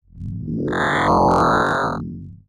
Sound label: Science Fiction Sci-Fi Electronic Distortion